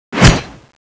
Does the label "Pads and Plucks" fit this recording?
No